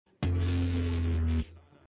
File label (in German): falsch sound; joko und klaas gegen prosieben